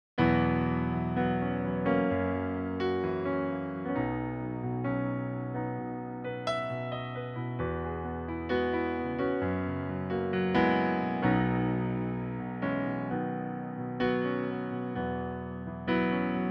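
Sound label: piano playback B major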